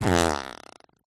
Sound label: cartoon fart